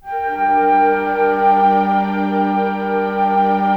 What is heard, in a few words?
PAD